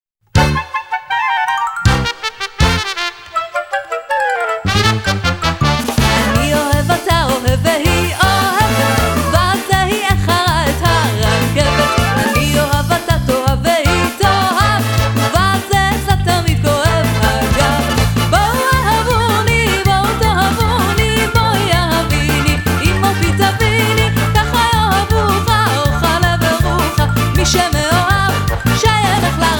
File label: Hora Ahava (vocal)